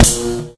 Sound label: stunstick flesh hit, weapons; hl2